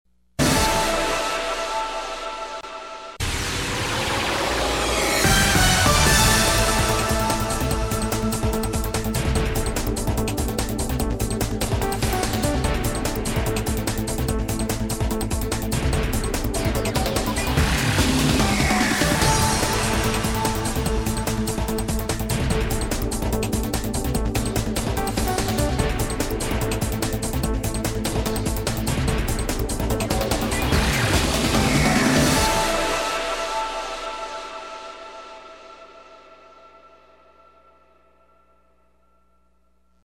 OPENING SOUNDER; KYRO

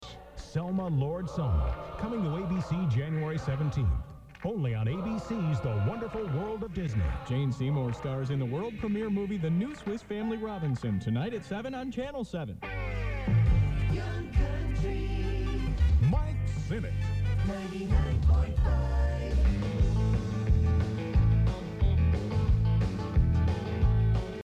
jingle sounder; WYCD

